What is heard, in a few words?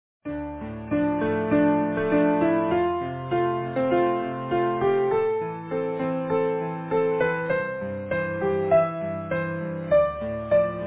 Easy Piano